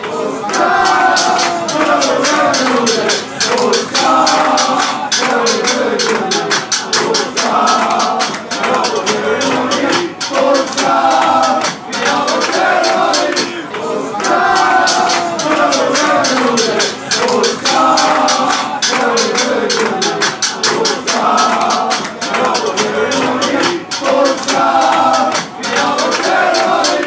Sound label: spain chant, fanchants